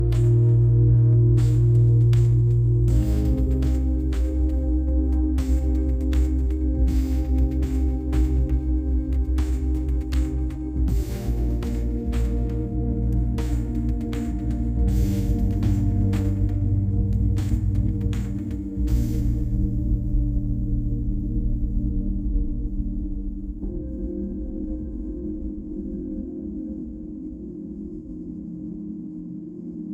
ambient urban

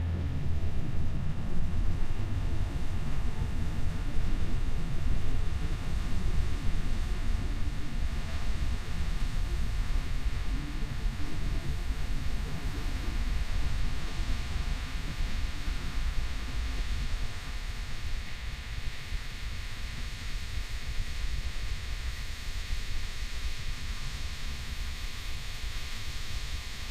ambient nature

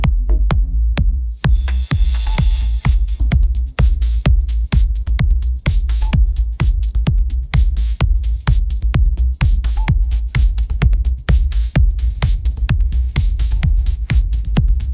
ringtone edm

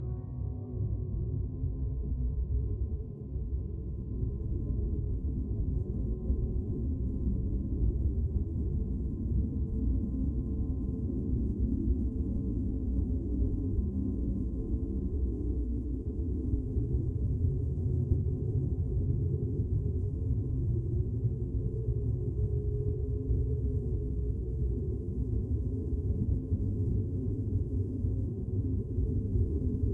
ambient nature

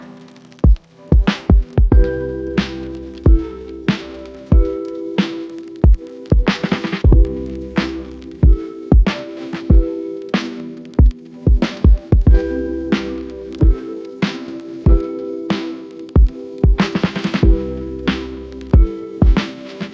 ringtone lofi

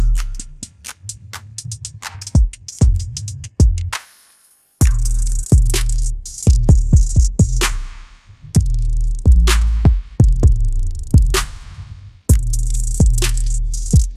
ringtone hiphop

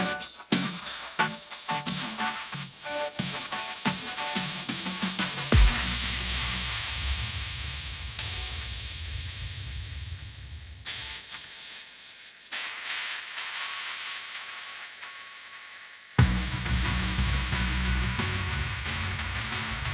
ringtone kpop

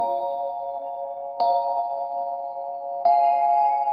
notification

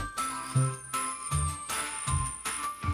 notification

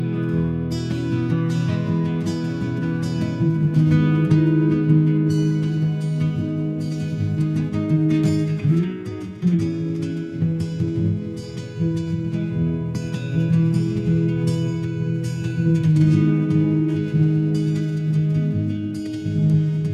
ringtone romantic

